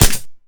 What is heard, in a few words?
Gun Paintball Shot; Weapons